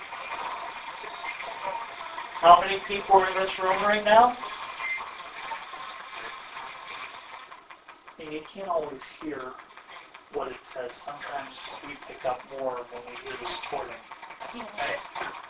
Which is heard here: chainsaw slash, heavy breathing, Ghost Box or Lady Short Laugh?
Ghost Box